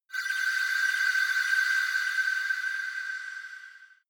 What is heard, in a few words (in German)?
shot universal glance, sweeps und swells; comedy-cartoon; soundpool